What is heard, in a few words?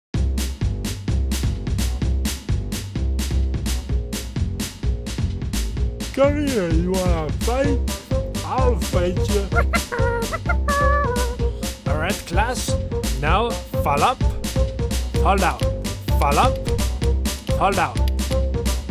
credits music; Shrek; XBOX